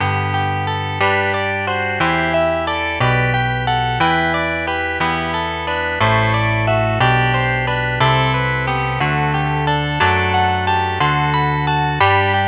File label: jazz guitar